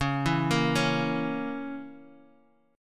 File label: A sharp Diminished C sharp